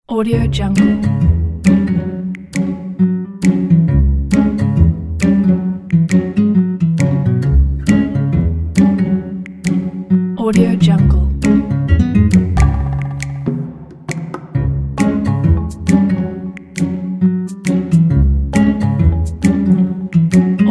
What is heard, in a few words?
fun quirky comedy mischief